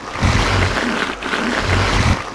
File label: engine; Quantum Redshift; XBOX; leah